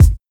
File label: short kick drum sound g sharp key; kick drum samples